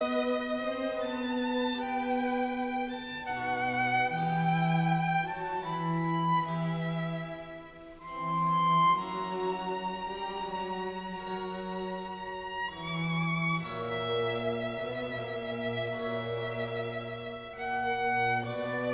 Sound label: Violin Concerto in C Major; Vivaldi